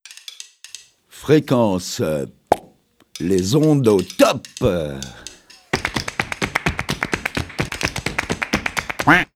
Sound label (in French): jingle frequence pop